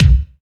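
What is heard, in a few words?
bass drum; AKAI S6000 CD-ROM - Volume 1; DRUM RYTHM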